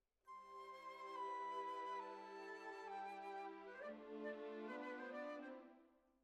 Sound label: Oboe